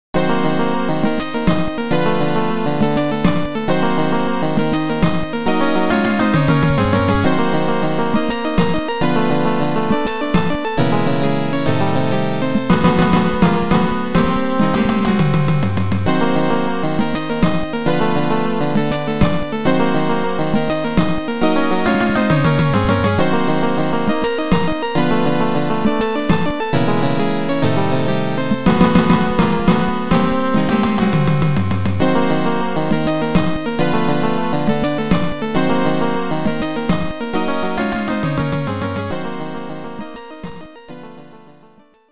Stage 6 BGM; Mazed Music

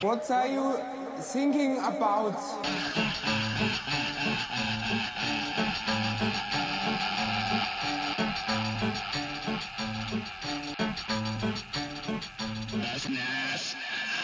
Vocals; PixelJunk Shooter; PS3

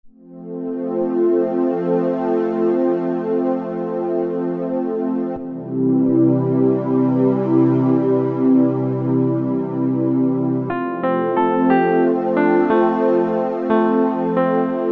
Somber Underlying Instrumental; the letter ps4 wii u windows gamerip 2014

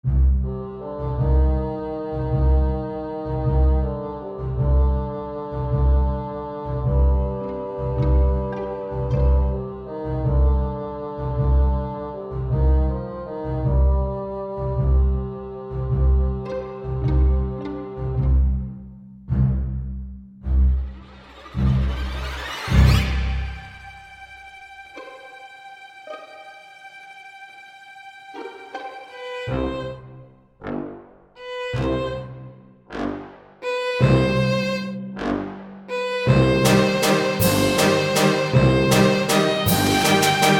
Waltz; The Henry Stickmin Collection

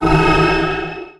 Enemy Bind

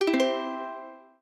save chime, mono; dokobots

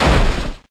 Focus Punch

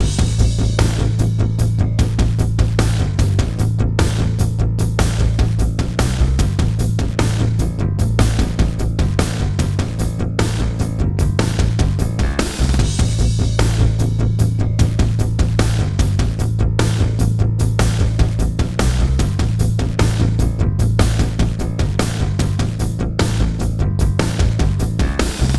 DRUMS BASS LP; transformers war for cybertron gamerip

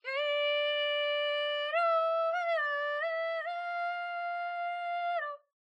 Kulning; bramble the mountain king; ps4 ps5 switch windows xbox one xbox series xs

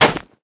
Footsteps Dirt Run; we are the dwarves gamerip 2016

